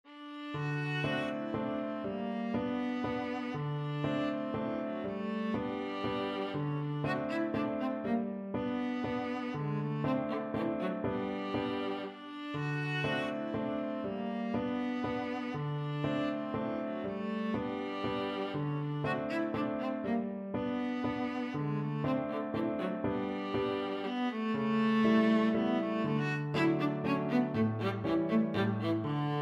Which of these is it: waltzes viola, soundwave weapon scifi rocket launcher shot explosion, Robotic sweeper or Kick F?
waltzes viola